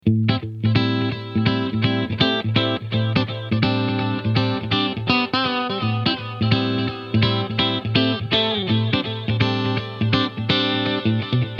Enveloping Echoes; Strymon Compadre → Strymon Iridium → Strymon DIG

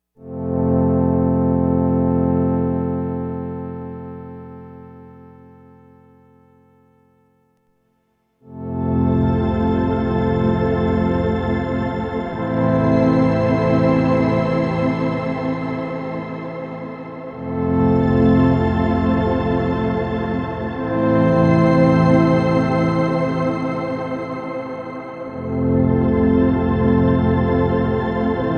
shimmer; bigsky

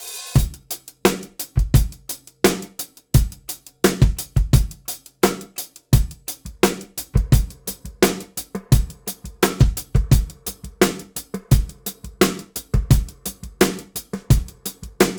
Snare Patter With Verb; Single moving head, dotted eighth note resolution, spring reverb, a fair amount of tape artifacts like Wow and Crinkle

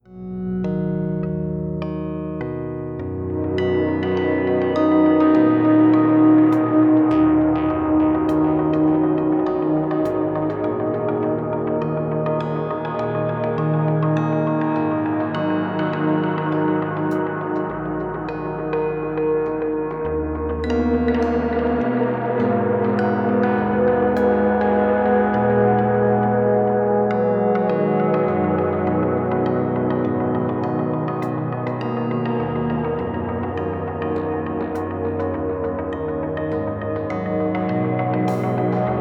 Tone Controls and Input Gain; StarLab

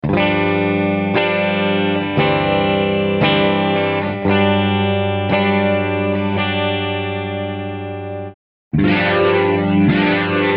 rotary; mobius

